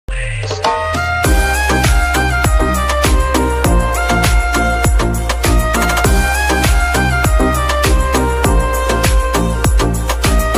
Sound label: flute ringtone; rhtdm